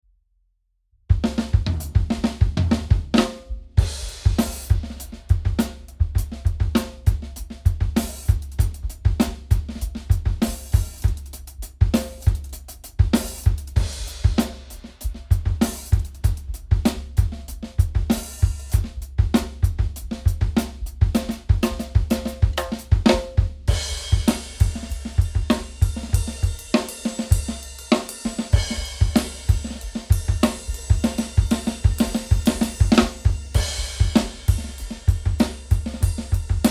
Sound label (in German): bearbeitetes drumset